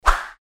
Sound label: Fighting Swing Sound; FXHome